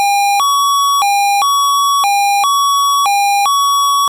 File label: Hi Low B, siren; whelen